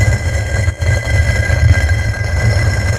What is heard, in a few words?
block scrape small; Level4 Hidden World